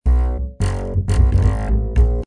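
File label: Bow Fiddle, Rockabilly; cool edit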